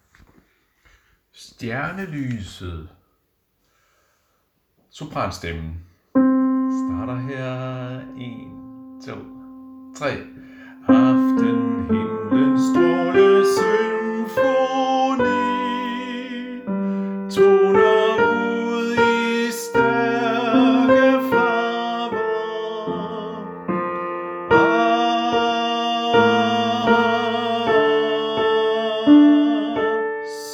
sopran; Størrelser; harlev koret

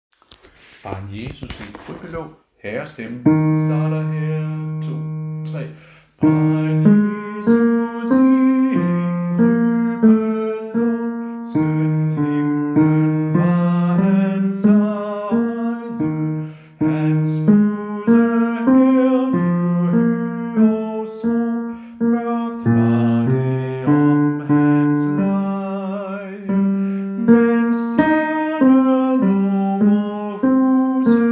baryton; Barn Jesus

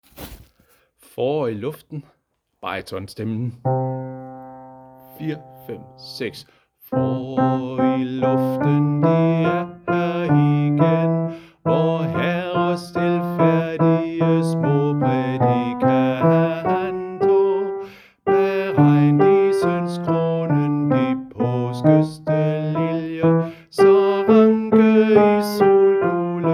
bas; harlev koret

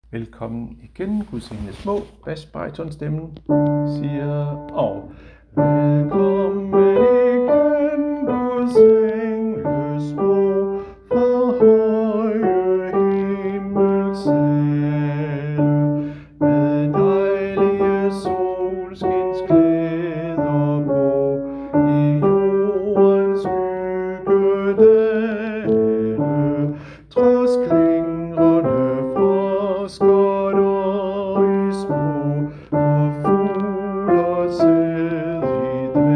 bas, koret; Velkommen

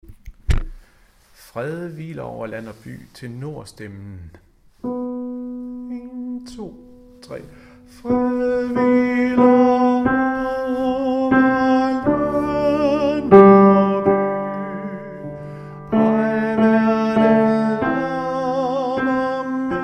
tenor; Fred hviler over land og by

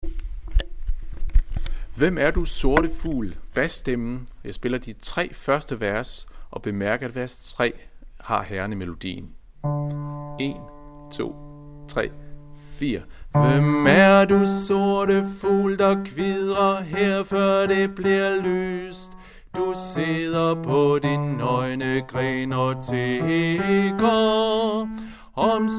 bas

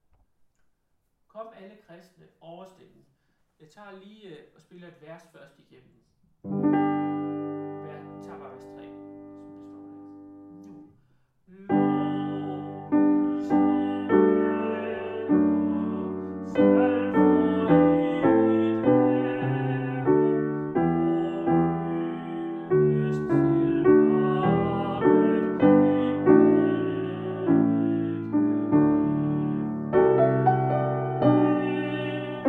Kom alle kristne, m. overstemme

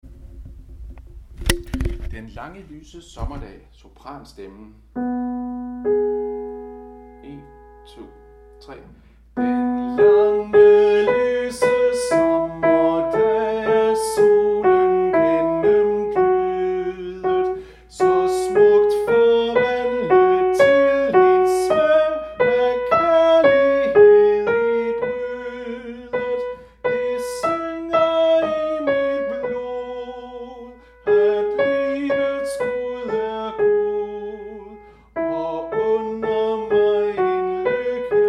sopran; harlev koret

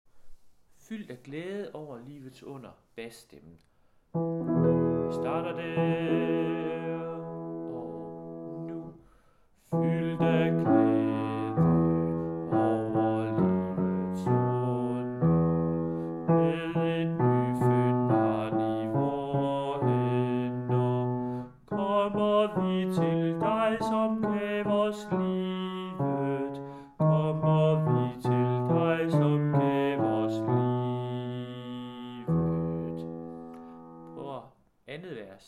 bas, harlev koret

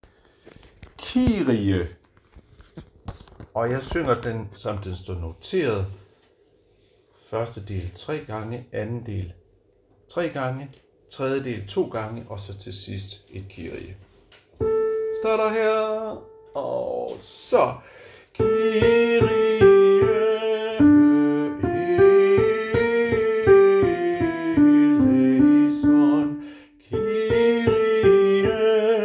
Kyrie gregoriansk sang, koret

